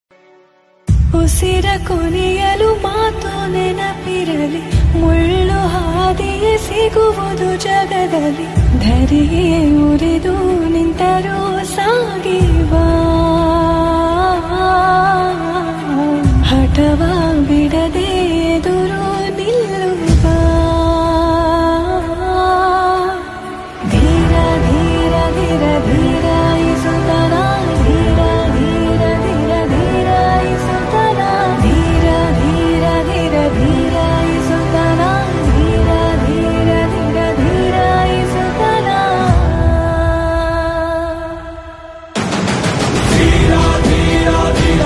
song ringtone

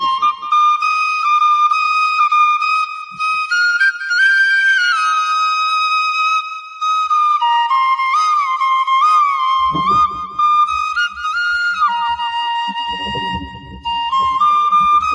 Flute Ringtone

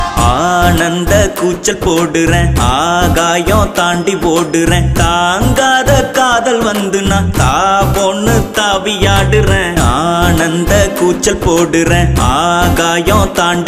Song; Kalavani 2

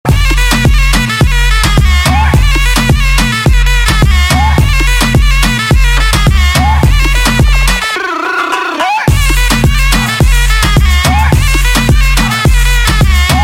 riddim ringtone

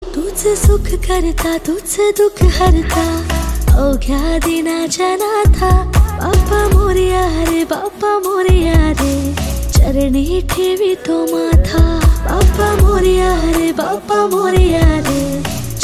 Female Version Ringtone

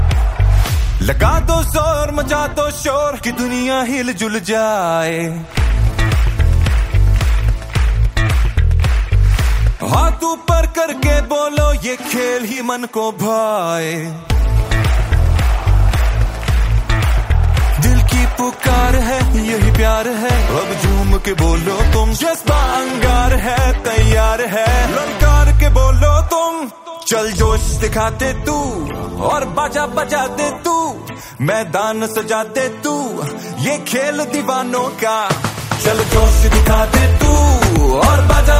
Anthem Song Ringtone; PSL 2020

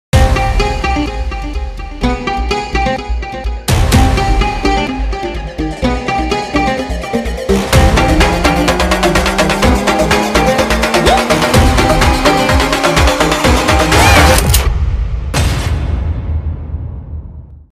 Trailer Theme; KGF 2

